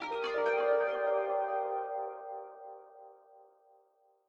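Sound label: Menu Start, game music; Proteus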